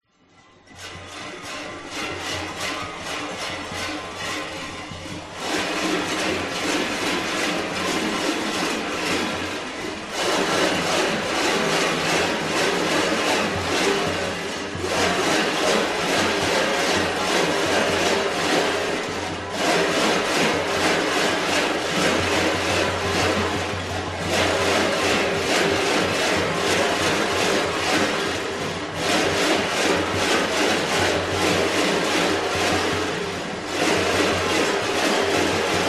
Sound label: Tribal Festival; Bolkan; west wolf